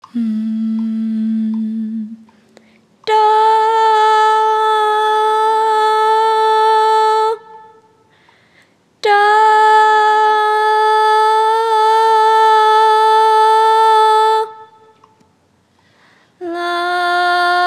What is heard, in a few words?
Intonation top starting note